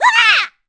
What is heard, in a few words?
Lava; Link falling in lava (#1 and #2 also used for falling on ice)